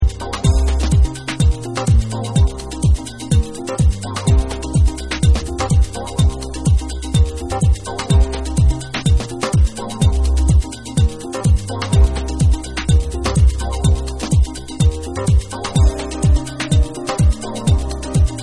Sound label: JAZZ